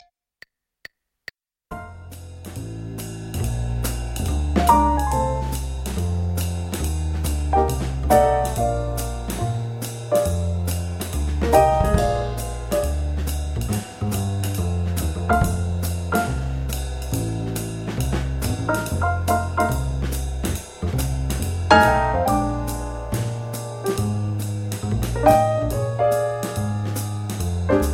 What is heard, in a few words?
Jazz Improv